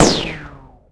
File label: gun; Phoenix